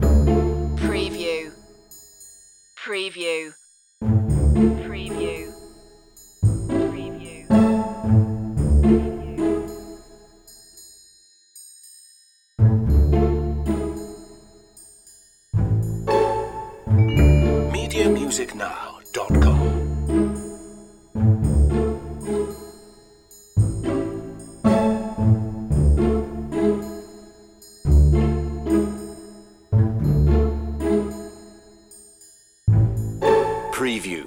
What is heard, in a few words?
pondering loop